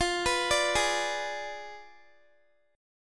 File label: F Diminished 7th F down Guitar; arpeggiated; tuning 4E,4A,5D,5G,5C,6F